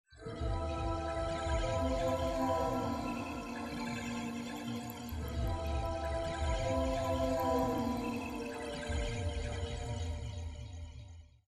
mastication effect

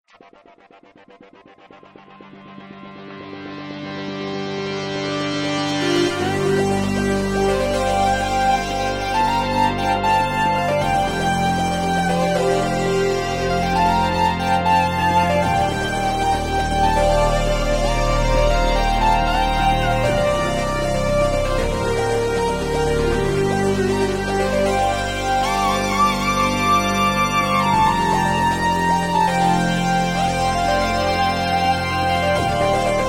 Memory Moog

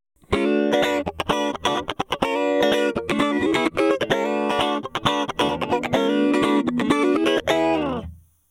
funk

